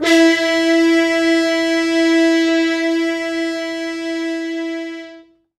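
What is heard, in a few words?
French Horns stops, E3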